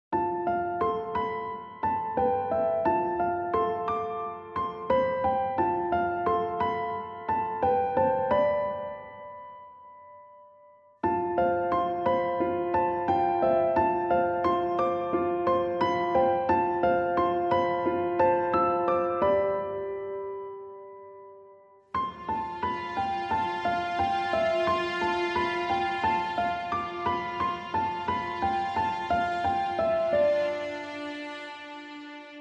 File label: Reduced Piano Reduced Strings